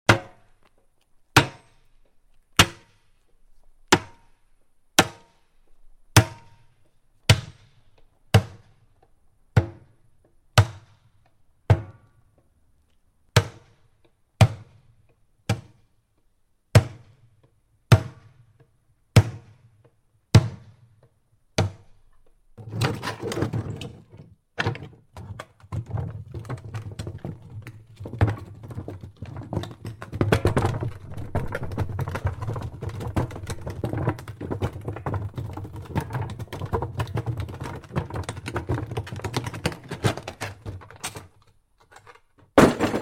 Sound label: Barrel Maker; Hollywood Edge Historical Series; Traffic Free BG's & FX